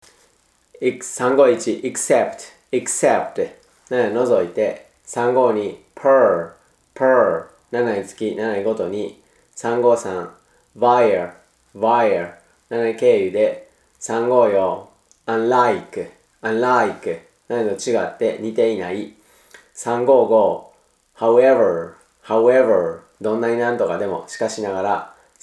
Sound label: tango